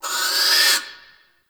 Owl Critters Vocal Alerted; Ambience; Warframe; Duviri

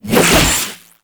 Briefcase Katana Spin Whoosh; Warframe; Weapons; Corpus

